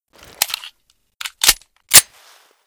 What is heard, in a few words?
colt reload empty